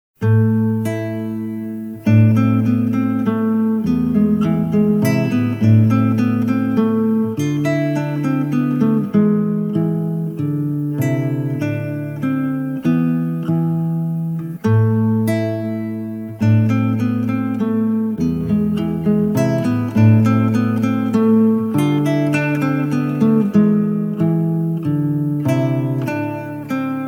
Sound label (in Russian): Menuet dlya lyutni